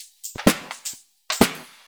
Electro Loop 128BPM; Vengeance Samples

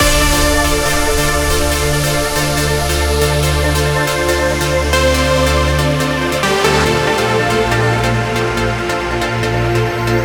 Complete Mix 140BPM, D minor; Vengeance Samples

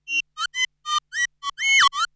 Roland D Line, Roland S100

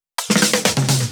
Fill 128 BPM; Vengeance Samples; VEH1 Loops

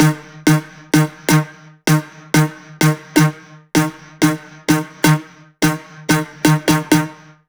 Melo 128BPM; Mini Kits

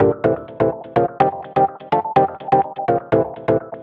bse 125 c, organloops; house; Vocal House; PS-28 Vocal House Samples; instrumentloops

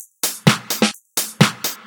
House loops 128BPM; Vengeance Samples

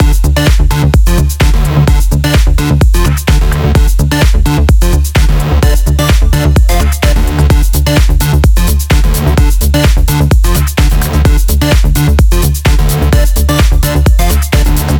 128BPM Mix; Vengeance Samples; Beatdancer Kit

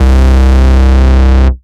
Ultimate Bass Root C; Vengeance Samples

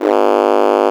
Bass, Root G#; Vengeance Samples

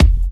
Soft Kicks; Vengeance Samples

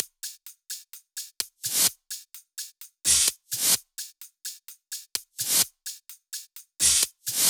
128BPM Machine Kit

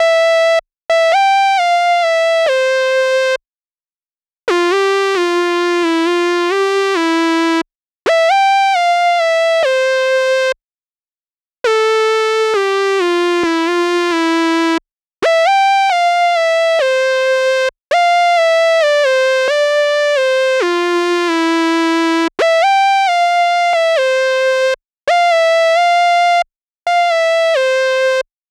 134BPM Mainlead DRY, Synth, Cmajor

